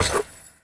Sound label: Street Ball Glass Basket Chain; SFX Kit